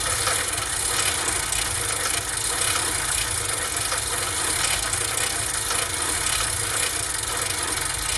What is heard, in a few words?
metal rattle; tanks; War Thunder